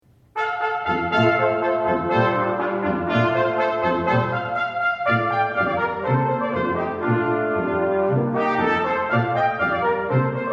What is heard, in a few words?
brass quintet